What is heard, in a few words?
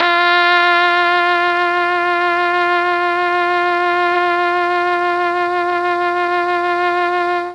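F3 trombone trumpet, mellotron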